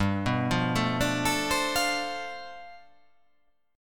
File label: G 11th